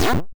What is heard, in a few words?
Hero Hit